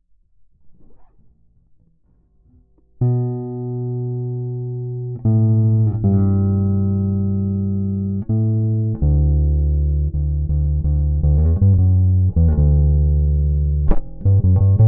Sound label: bass riff